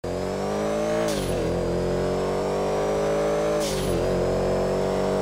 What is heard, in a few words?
idle, car sound